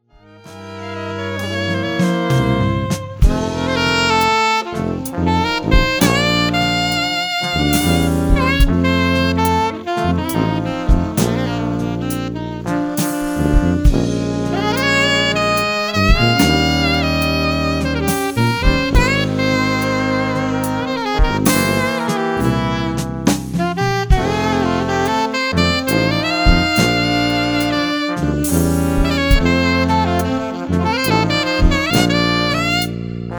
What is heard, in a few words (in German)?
Meditation